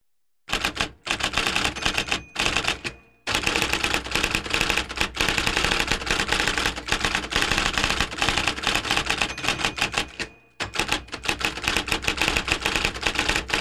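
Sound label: sound of typing on a typewriter fast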